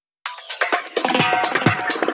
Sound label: Fill 128 BPM; Vengeance Samples; VEH1 Loops